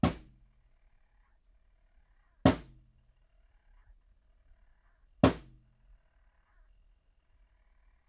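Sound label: 3 hits drum courtyard rec in cell C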